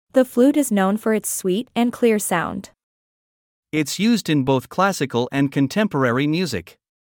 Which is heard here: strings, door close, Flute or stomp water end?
Flute